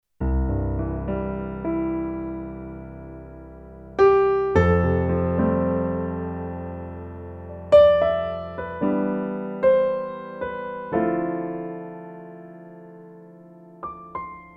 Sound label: Roland FP 30X Piano